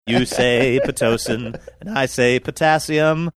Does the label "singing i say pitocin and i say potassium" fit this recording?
Yes